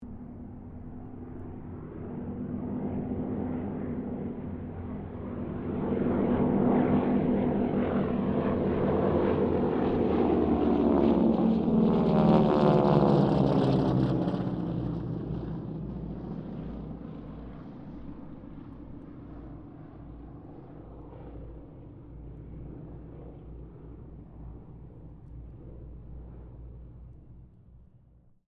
Harvard squadron 4 pass, stereo

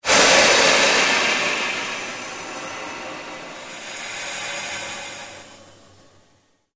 Created Pulse Fade, Rise And Dive Sound Effects; Sound effects created by manipulating other sounds; Rises and Dives

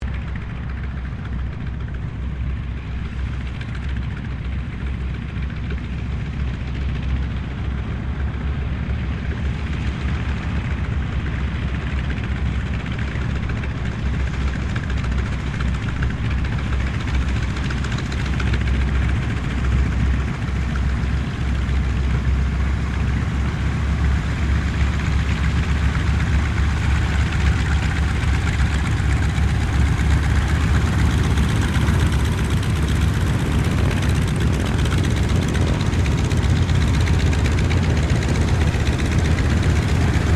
AIRCRAFT TROJAN SQUADRON 4 TAXI; Mixed aircraft squadrons; Airplanes; Sound Effects:Vehicles

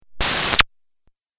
Laser Hiss Video Game

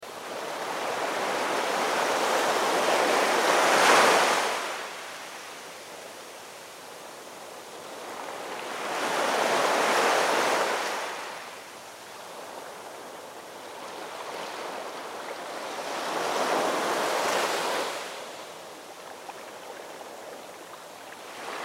Ocean Waves Beach Loop; Nature; Ambience; Blastwave

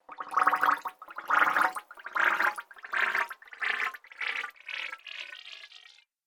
Water Bubbling Echoes, cartoon

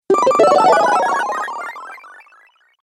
Retro Videogame Level Clear; Retro Videogame Fx; Video Game Sound Effects

